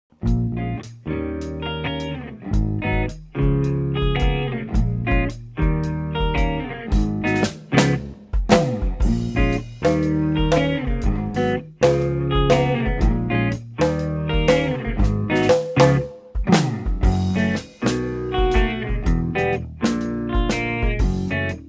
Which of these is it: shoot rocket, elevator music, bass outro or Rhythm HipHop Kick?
elevator music